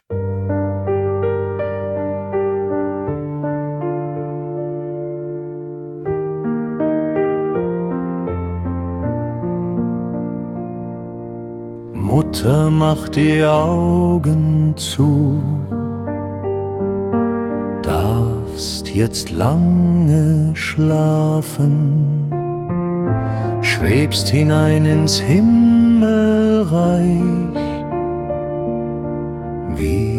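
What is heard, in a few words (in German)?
getragen, Klavier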